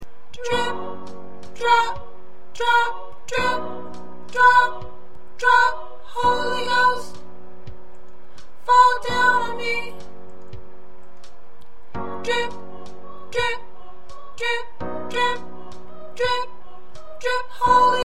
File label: Alto